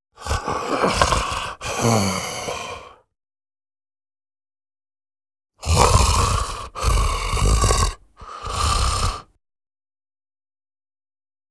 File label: ZOMBIE MALE HUGE CALM WANDERING BREATHING GRUNT GROWL